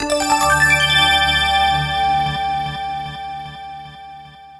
Ringing Notify Tone

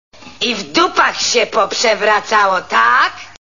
sms

